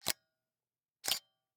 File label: Camera, Pentax KS2, DSLR, electronic, mechanical, snapshot, exposure hold, release; Eiravaein Works; Vaeyan IV